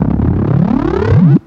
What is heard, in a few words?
MONST, fx & sweeps; EZRollers